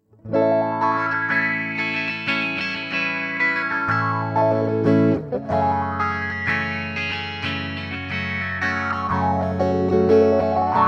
Auto wah

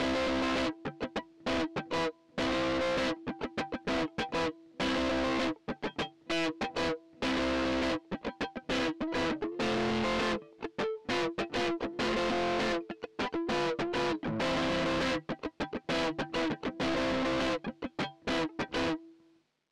Guitar Rythm Distortion HP350 LP4500

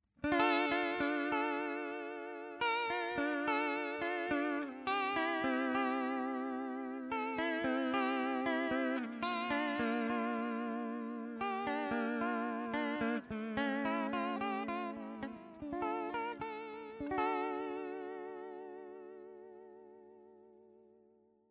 Rotary

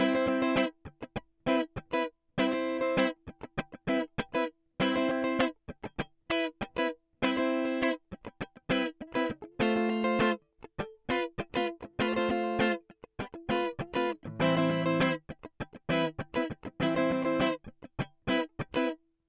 Guitar Rythm Lowpass 4kHz

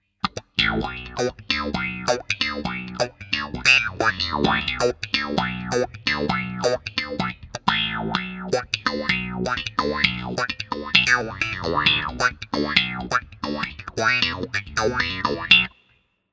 Auto wah

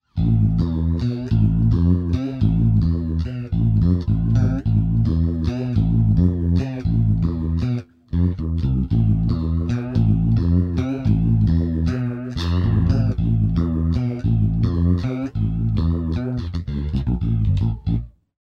Flanger space

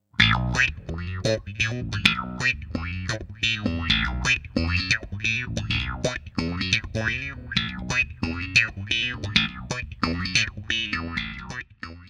Wah wah slap

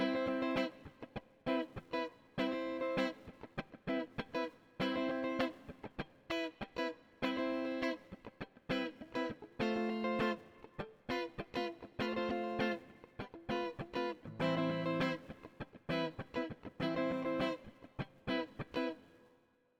Guitar Rythm Reverb

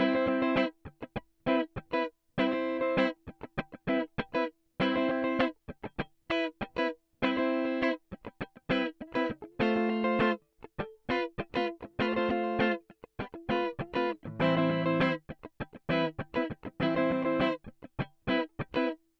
Guitar Rythm Highshelf -10dB 4000Hz

